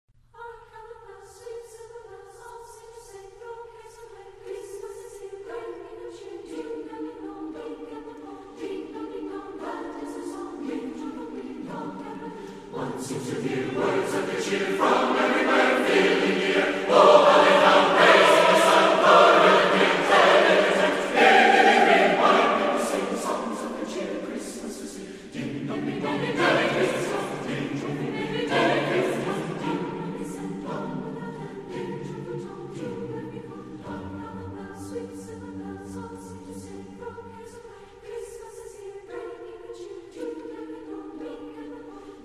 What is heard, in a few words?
SATB